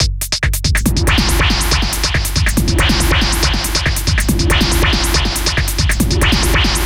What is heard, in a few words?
ELECTRO LAVA, PLASTIC ELECTRO GROOVES